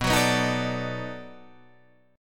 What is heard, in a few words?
B 7th Sharp 9th, down, Guitar, Open D